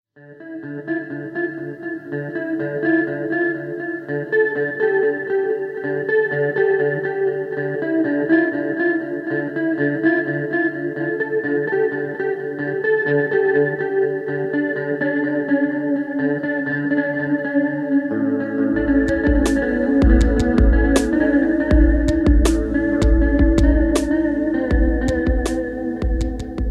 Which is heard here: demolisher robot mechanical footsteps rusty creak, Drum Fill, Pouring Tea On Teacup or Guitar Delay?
Guitar Delay